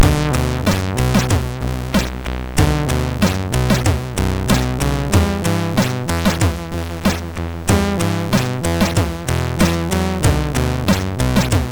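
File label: Poker, MOS 6581 SID chip music, games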